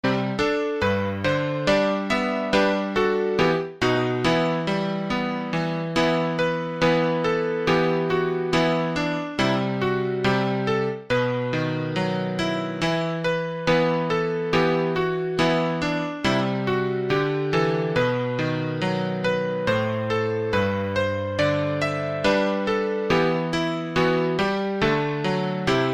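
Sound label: god rest you merry gentlemen piano instrumental ringtone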